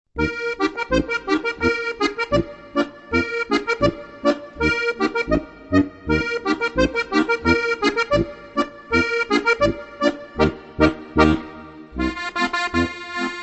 boarisch; Leicht & Guat - 15 leichte Boarische

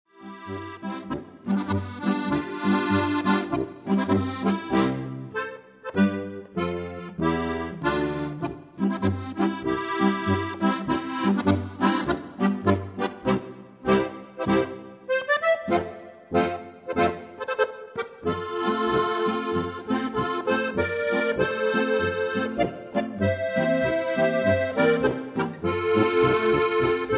marsch; Harmonikastückl aus Bayern

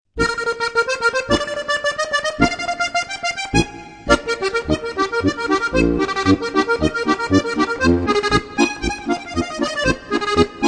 polka variation

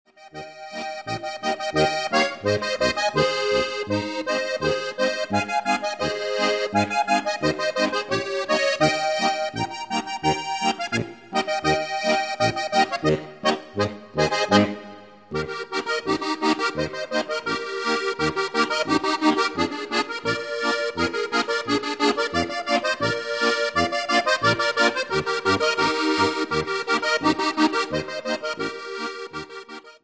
boarischer

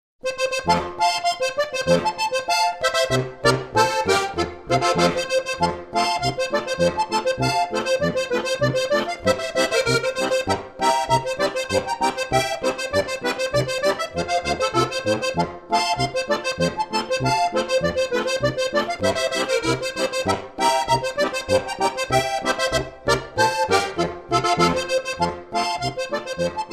boarischer

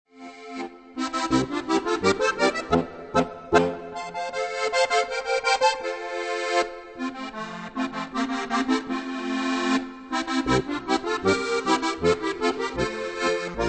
steirischer harmonikamarsch

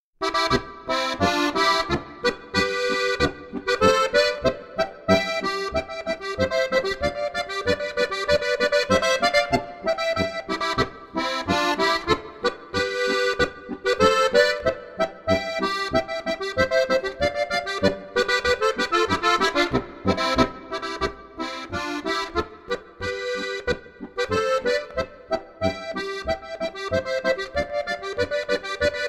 boarischer

